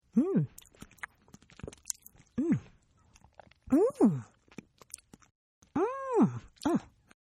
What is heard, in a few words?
Eating; Human